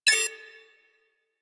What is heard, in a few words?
Cipher Countdown, UI; Warframe